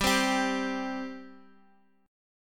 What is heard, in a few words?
C 5th, G